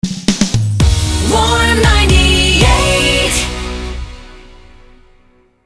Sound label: jingle rapid fire